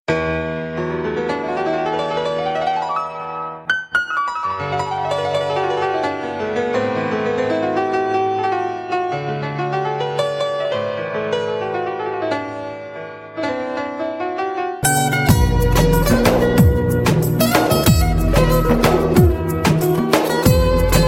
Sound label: Romantic Love